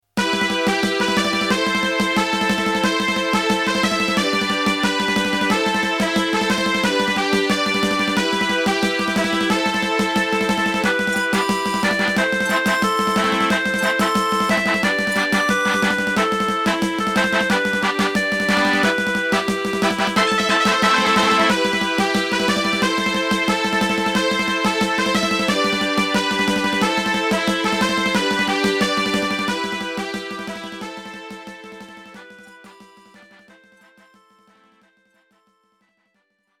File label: fanfares